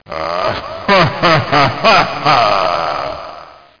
laugh

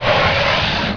blow; BESTSHOT; noisybit

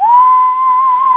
whistle; da capo vol1; effects stabs

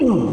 GRUNT

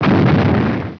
EXPL; PC Gamer IT CD 31; ARMRCMD

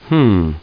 hmm; PC Gamer IT CD 31; ARMRCMD

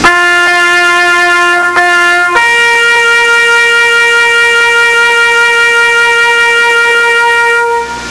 Lose; StarBound

